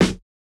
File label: snare, snare house